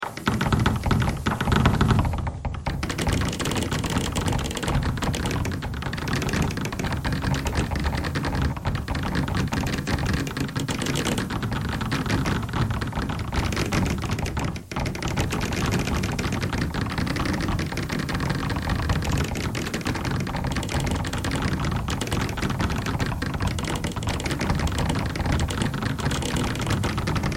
bagpipe thread noise; bagpipeclick

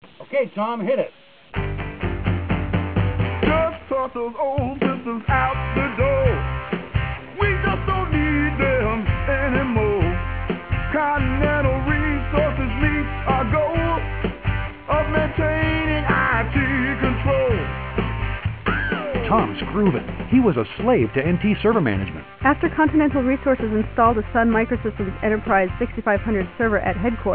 rock n roll

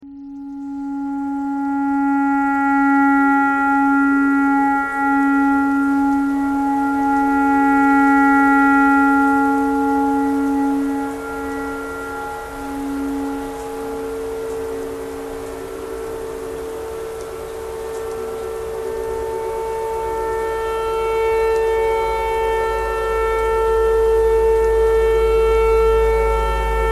tornado siren clarinet thread pentatonic

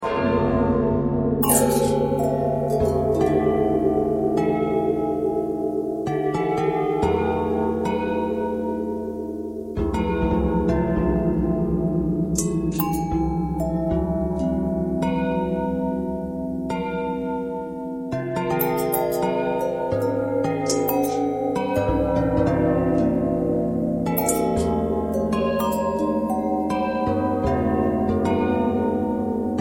longbell bell bendtwist

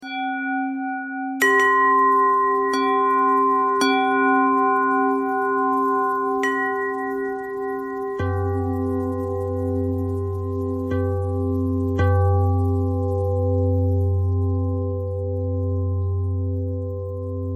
bell bend threads

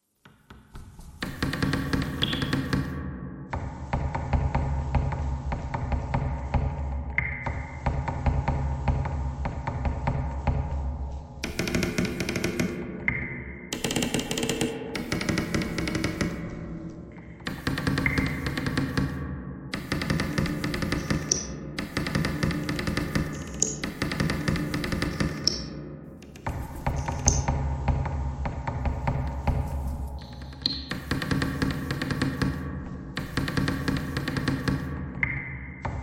bagpipe thread noise; reverb

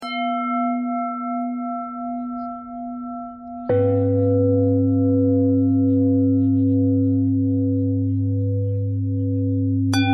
bell bell thread simple, bells

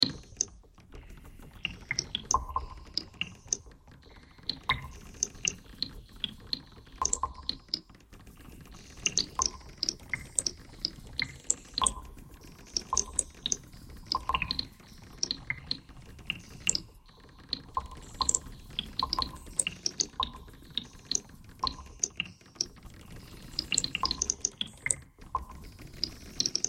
bagpipe thread pentatonic

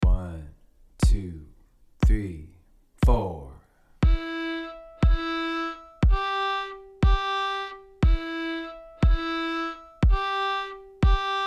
two bows

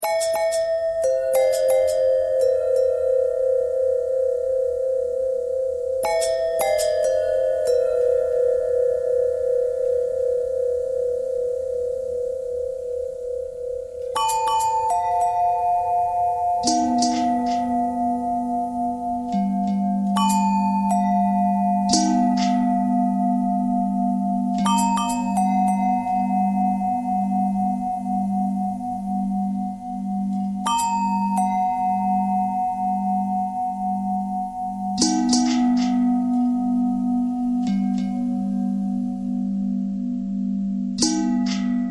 long bell long bell threads, bells

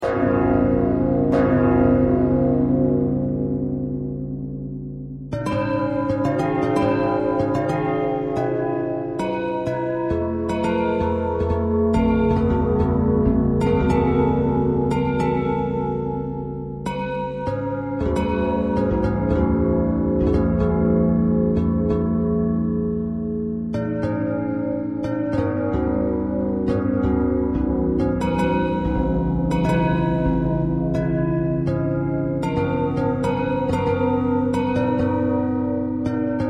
bell bell thread noise, bells

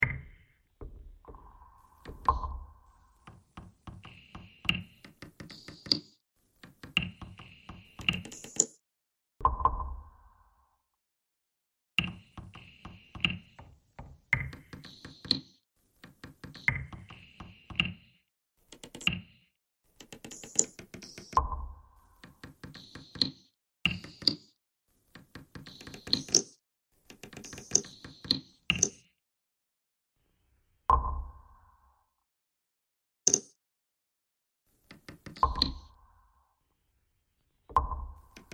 bagpipe thread harmonic

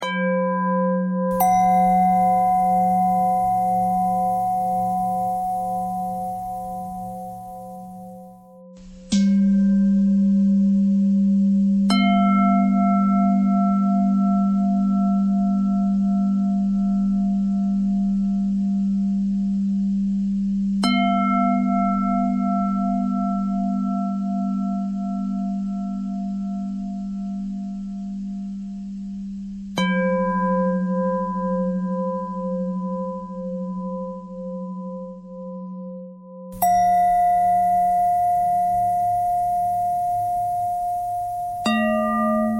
bell threads

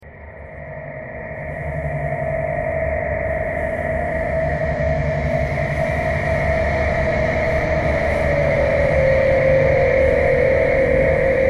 thread buzz

